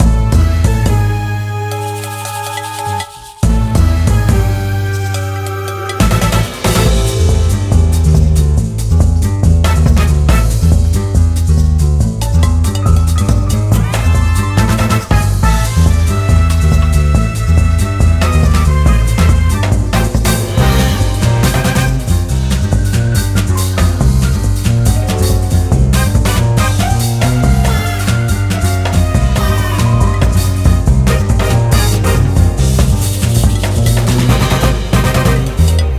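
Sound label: background music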